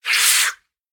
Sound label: biter roar short; factorio; Factorio 0.14.21; creatures